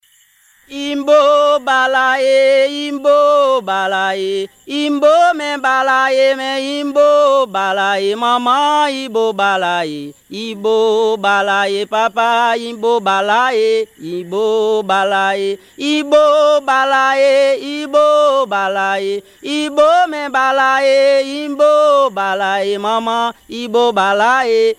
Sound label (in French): CHANT